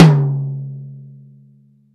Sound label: TOM, Drum Dry; East Collexion; Drum 1